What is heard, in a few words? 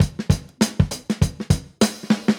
Live Drum Break Wet